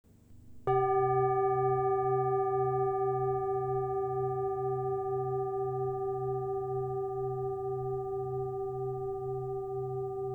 Weiße Tara

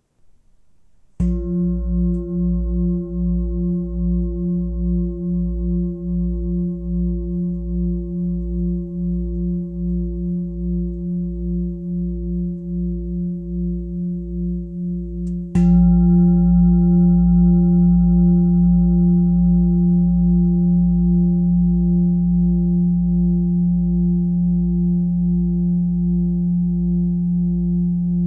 Fussklangschale 10670g 51cm a 58hz

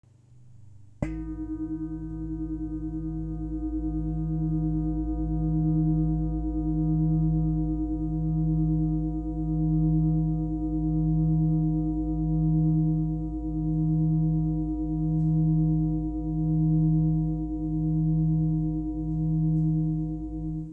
Fussklangschale 10.6kg maechtig; Gruene Tara

